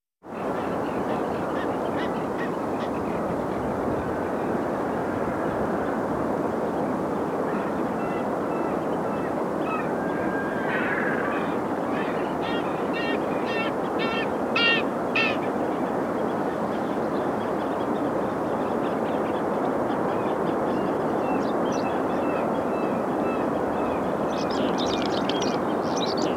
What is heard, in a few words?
Relaxing On a Tropical Island Paradise; Dr. Sound Effects; 210 Nature Sounds - 20 Hours of Relaxing Natural Ambiences for Meditation and Sleep